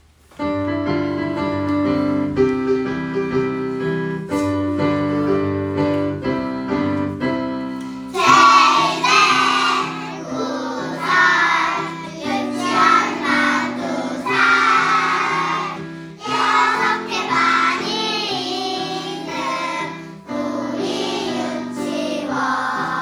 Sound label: child song